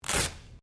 Sticky Tape Rip; common tape; Offices

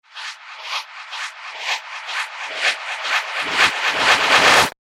Hairy Whoosh Ends Abruptly; Whoosh Sound Effects

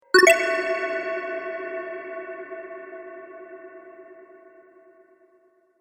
Game Cavern Tokens; Video Game Sound Effects

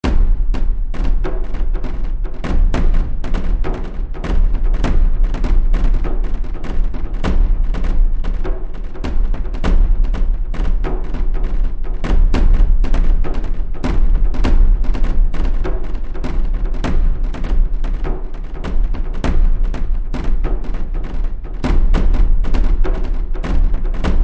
Drums Of Chaos, scary soundscape; Scary Soundscape Sound Effects

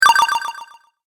Game Health Points, Video Game Sound Effects; Twistedtracks

